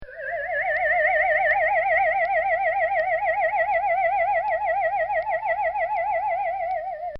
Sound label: Cartoon Sound Effects Whirls; Cartoon Sound Design; Bobby Cole